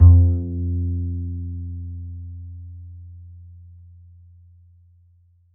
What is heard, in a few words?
A, Upright Acoustic Bass